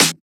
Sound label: Snare; FLPRO